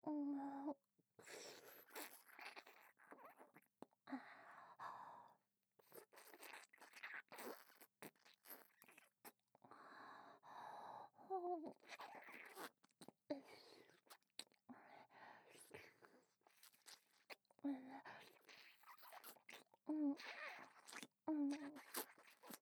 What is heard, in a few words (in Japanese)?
舌 フェラ, 喘ぎ 耳舐めのみ; キス